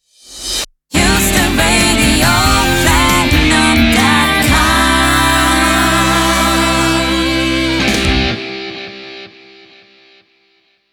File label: Radio Jingle 140bpm Sting; Houston Radio Platinum